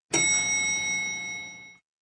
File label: Beeps; 헐리웃특수효과음; 신호-경적